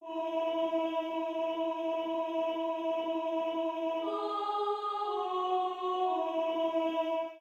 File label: Barbershop Tags